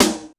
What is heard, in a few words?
Korg 01W MegaDrums; 300 Drum Machines